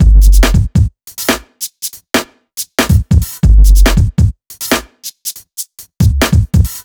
Breakbeat; Vengeance Samples; VEC2 Loops

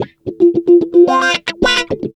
guitars; Vocal House; Instruments; PS-28 Vocal House Samples

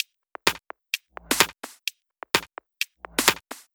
Drumloops 128BPM

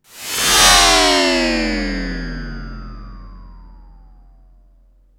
Downlifter

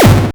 Bassdrums Hard Kicks; Vengeance Samples

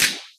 Snares & Claps; Vengeance Samples

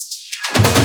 Loops Fills; Vengeance Samples

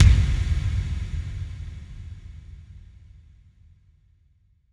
bassdrum reverb FX kick; Vengeance Samples

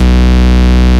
Root B, Ultimate Bass; Vengeance Samples

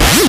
Acid Sounds F#, Synths; Vengeance Samples

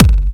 Sub Perc, Bassdrums; Vengeance Samples

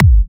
Hard Kick; Vengeance Samples

